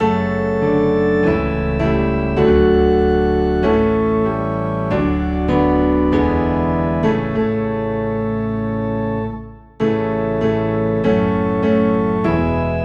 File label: Piano and Organ Low in A; Holy Holy Holy